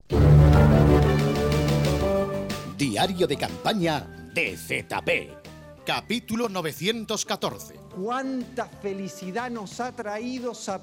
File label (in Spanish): risa